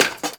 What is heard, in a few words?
Cafe Chair Short; Warframe; Nineteen Ninety Nine